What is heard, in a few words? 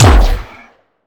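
Sci weapon Shot Medium Gun Assault Rifle; Robobeat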